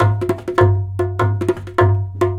100 DHOL, Indian Traditions; USB Soundscan vol.45; AKAI

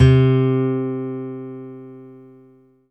ACOUSTIC C4; Greytsounds - Scott Peers Sound Engineering

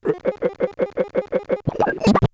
Bent Talking Teacher, Circuit Bent

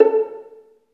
STR Violin, Solo Violin FX; Roland L-CD702; Solo Vln FX

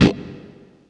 stroke, guitar; East West; Steve Stevens Guitar Samples Collection

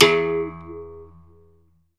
WA-WA, Berimbau

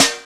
SNR SN, Drums; AMG; Now CD-ROM (Roland)

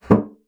bassoon slap; Xsample VOL-6; C BASSOON

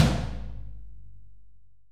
HALL AMB TOM

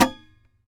SAZ TAP; Spectrasonics; Hans Zimmer Guitars VOL-2

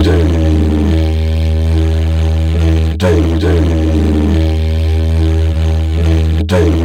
Didgeridoo; Sonic Foundry (Sony Creative Software); Continental Drift Aftershocks

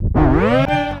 tape fx, scratch; Ambient Grooves

